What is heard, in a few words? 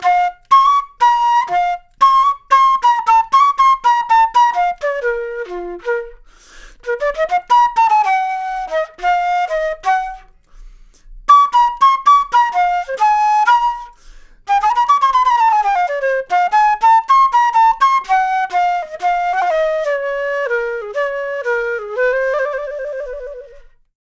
Flute Solo, Jazz